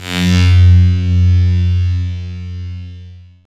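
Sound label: SYN, Roland, synth digital; Sound & Vision - Gigapack I CD 2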